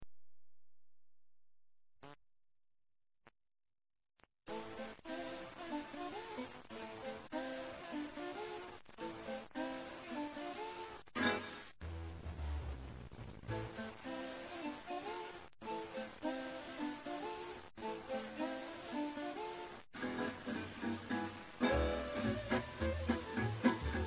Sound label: jazz